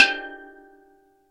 STEEL DRUM, Steel Drums; E-MU Producer Series Vol. 2; More Studio Essentials; Composer

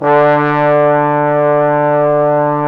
BRS FF BON, Bone Sec.1; Roland LCDP06 Brass Sections

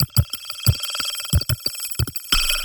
FAST SHIMMER LOOP

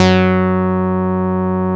MC 202, BASSES

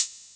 hat, Techno 138 BPM

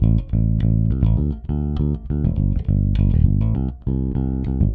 Rock, Bass; Bass X

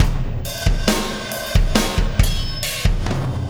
Impact Crater Beat, Processed Drumkits

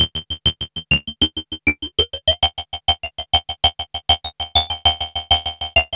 XPANDER; AMG - Vince Clarke Lucky Bastard

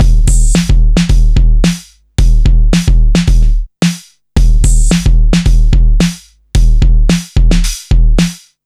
junk hip hop 110bpm

